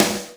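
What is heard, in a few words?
hard snare, Boss DR660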